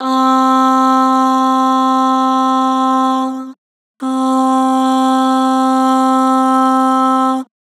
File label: "Aah", Harmor Vocals